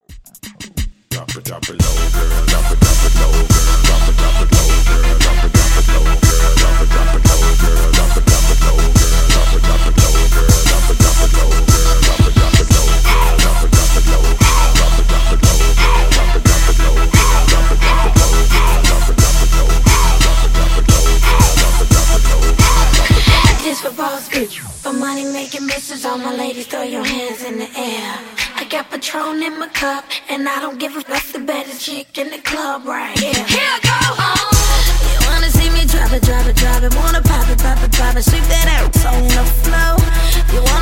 HipHop, 95

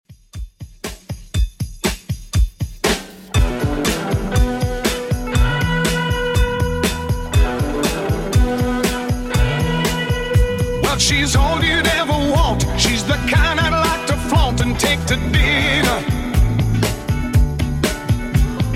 70s Redrum 120bpm

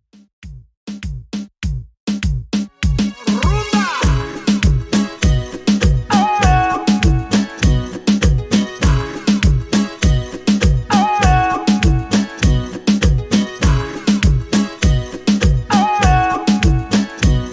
Dj Intro Reton 100Bpm

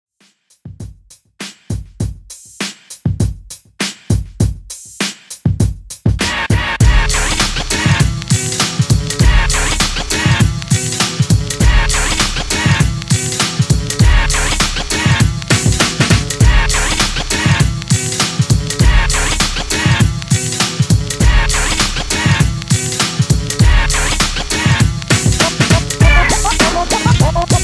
90s R B 100 Bpm

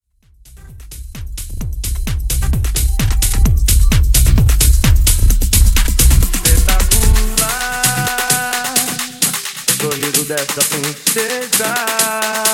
Tech House 130Bpm

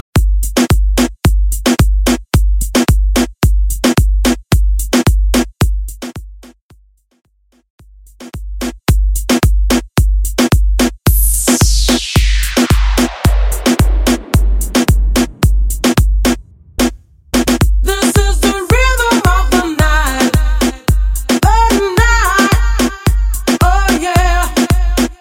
Moombahton RMX

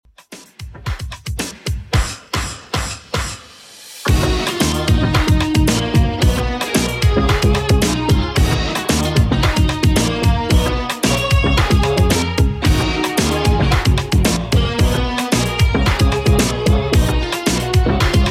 90s Redrum 112 bpm

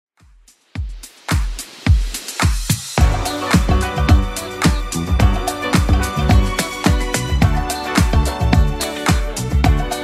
80s Club 108 bpm

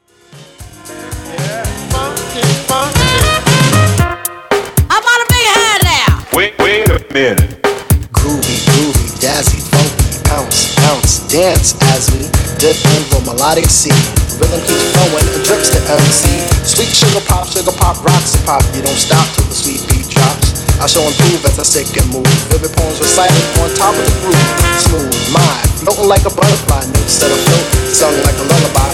Classic ReDrum 115Bpm; 90s

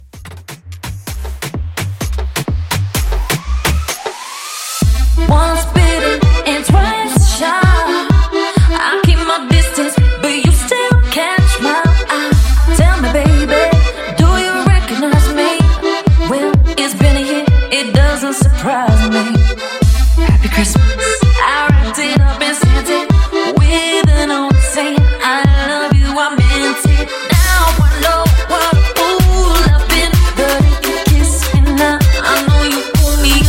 Christmas Afrobeat Mashup, 128; Kuduro

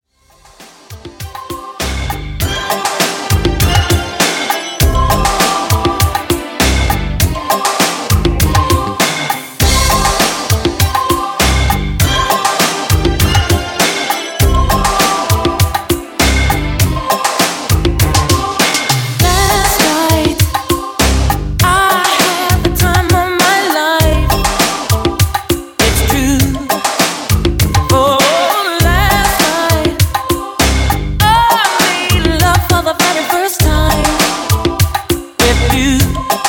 80s ReDrum, 100 Bpm

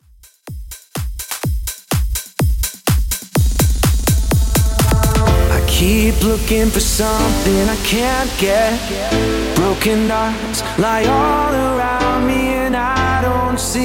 Club 125Bpm

